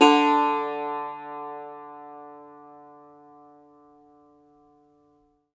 cumbus saz D, plucked strings; E-MU Producer Series Vol. 7 – Old World Instruments

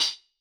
Solid Quartz, Hats; Airwindows

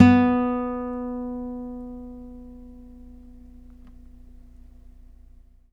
NYLON P B2, GUITARS; Synclavier Sampler Library

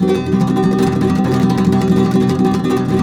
C# M, Guitars; Spectrasonics; Hans Zimmer Guitars VOL-2; FLAM TREM CH

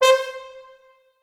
Orchestral Brass

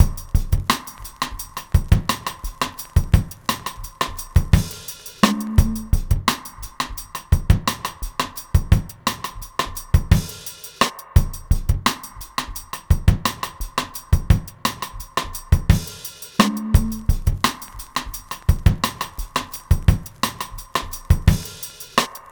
86 bpm; MEAN GREEN; 90 s Sample CDs; Planet of the Breaks

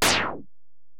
EPerc, Alesis DM-PRO; 300 Drum Machines

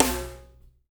SNR

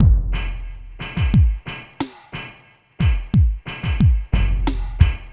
Beat, Hip-Hop

